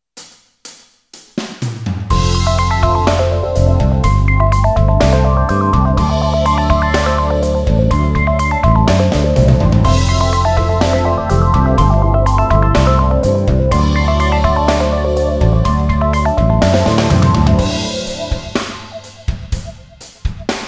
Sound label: fokus synth